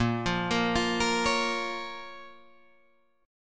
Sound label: A sharp Minor A sharp down Guitar Drop D